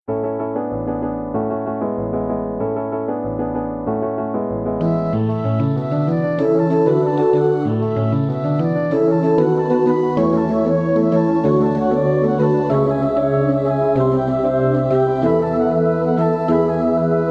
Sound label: MIDI